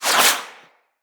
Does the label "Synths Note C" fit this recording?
No